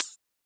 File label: Closed Hat; FLPRO